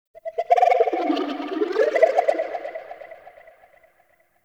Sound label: Ocarina; Warframe; Nokko Colony; Ambience